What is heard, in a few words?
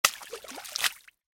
mud splat